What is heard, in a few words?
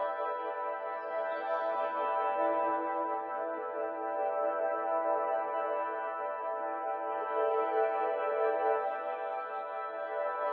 Menu happy music; Kensho; ios gamerip 2017